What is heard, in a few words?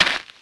dirt, player; tfc